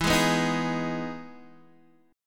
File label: E Major 7th E, Guitar, Drop D; chord shape